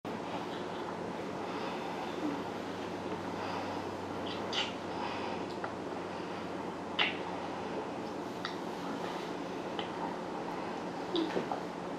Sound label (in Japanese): キス